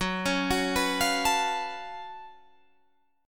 Gb Minor Major 11th Gb down Guitar Open G

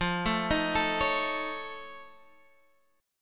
F Augmented 7th, Guitar, Half Step Down

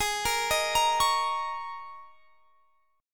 Ab Suspended 2nd Suspended 4th; arpeggiated; Absus2sus4; x,23,20,20,23,21

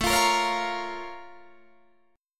A sharp Minor Major 7th

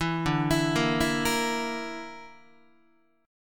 D Suspended 2nd Sharp 5th D, Guitar; Dsus2#5; arpeggiated; JGuitar

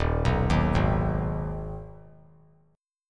D sharp Suspended 4th Sharp 5th; arpeggiated; chord; 3,6,6,6; JGuitar

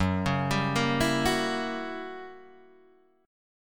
Fsharp 7th Flat 9th down Guitar Open D

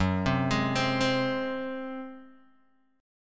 Gb Suspended 2nd Flat 5th Guitar Open D

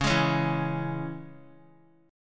Db Minor, Guitar; strummed; JGuitar

